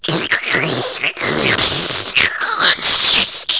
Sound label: big slurp; svencoop; teletubbies